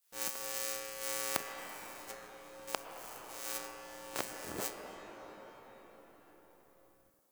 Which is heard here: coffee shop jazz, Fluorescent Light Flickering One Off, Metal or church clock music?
Fluorescent Light Flickering One Off